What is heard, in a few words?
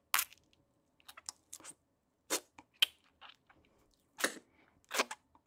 impacts; Lewd SFX